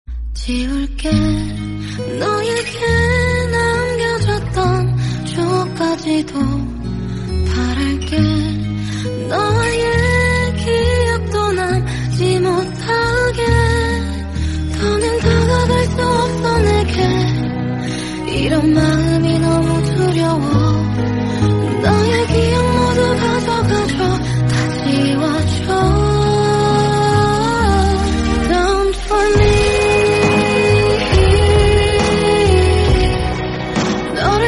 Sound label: korean song; hotel del luna